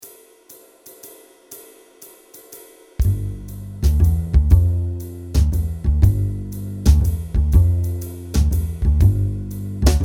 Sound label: blues playalong; IV in bar 5